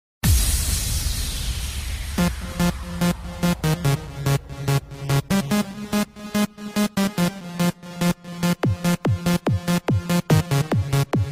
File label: electro mix, ringtone